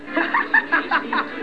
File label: hahahaha; Mush; Newsies